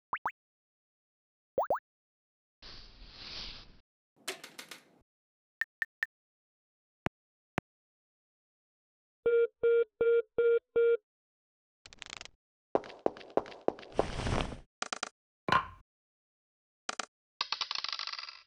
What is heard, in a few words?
random sfx